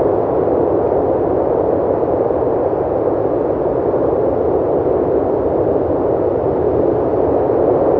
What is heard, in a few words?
engine plane air; War Thunder